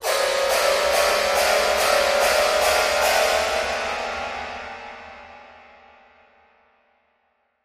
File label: Piano Strings, keyboards piano